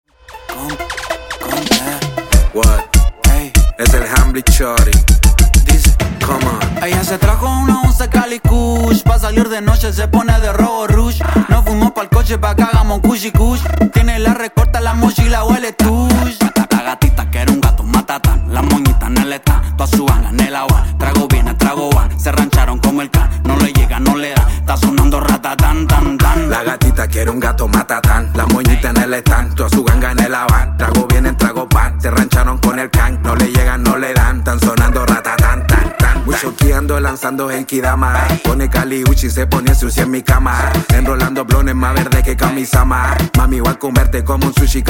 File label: Dj Intro Outro TikTok Redrum 98 Bpm